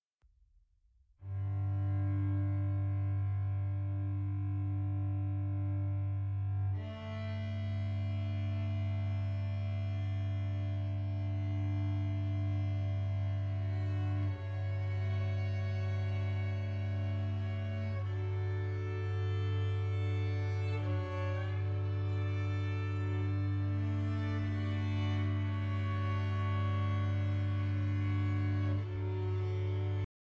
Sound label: String Quartet Slide